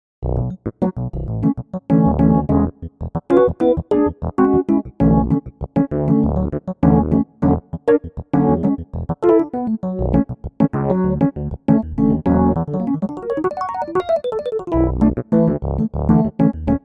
phaser; di mark

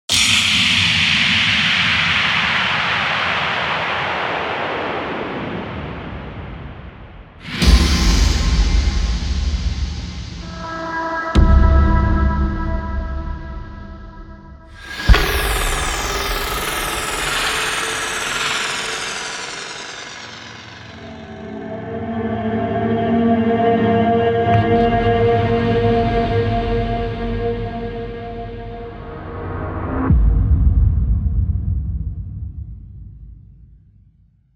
club impacts

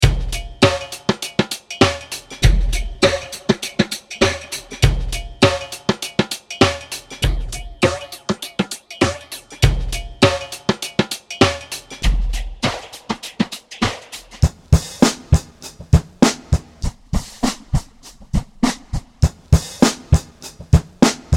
chorus on drums; nuxx

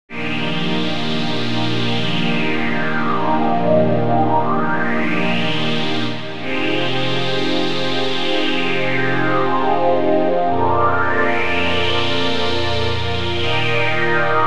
pad 4 voices; manetic storm

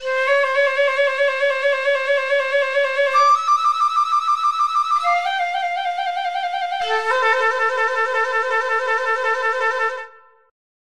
flute trills

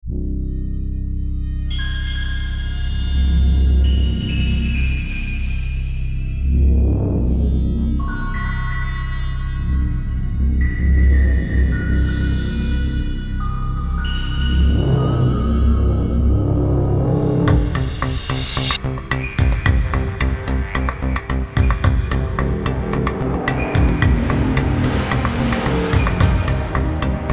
sci-f; cheeze machine pro

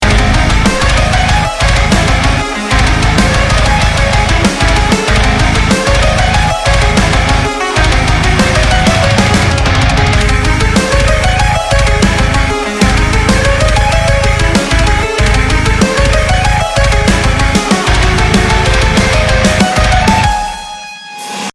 bass deathcore example

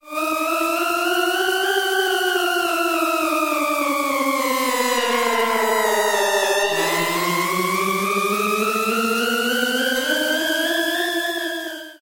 harrowing alarm

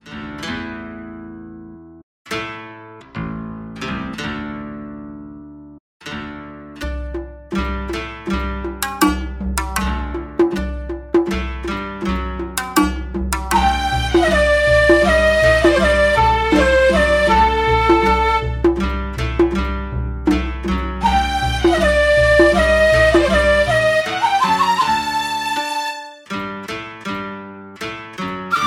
shamikoto arabian town; hang em

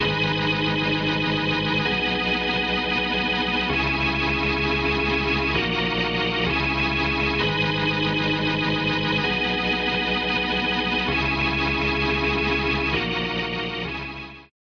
organ dry; blurry cabinet

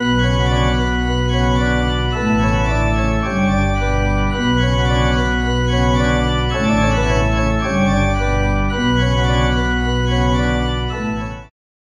gritty tint organ dry

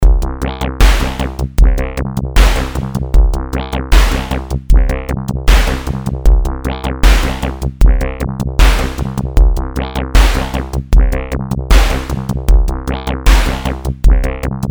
x mod mixed seq slow sound

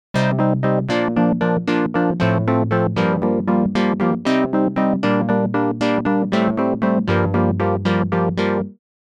bit velo saw perc; pro12

